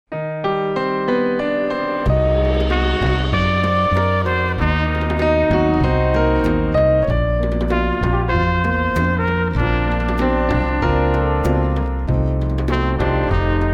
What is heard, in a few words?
Latin Dance